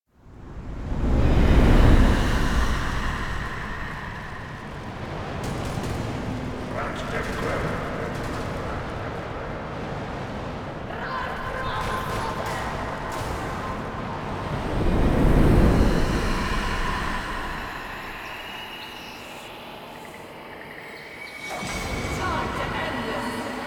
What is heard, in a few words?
Whooshes; Warframe; Warframe Intro Choice